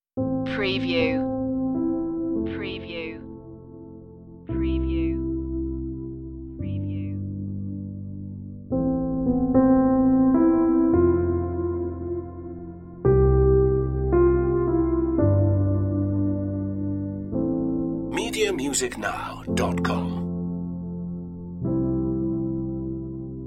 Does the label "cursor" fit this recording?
No